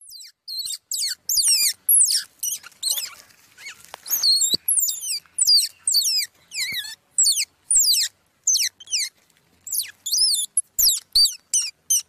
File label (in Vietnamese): Tieng soc con keu goi me